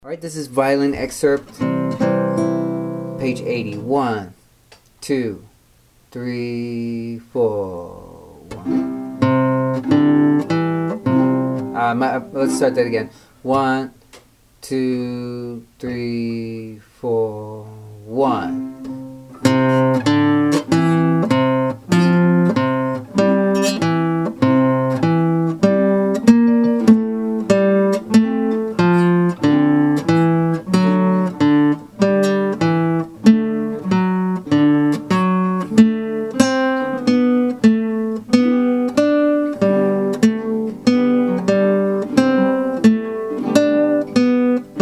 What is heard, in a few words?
violin excerpt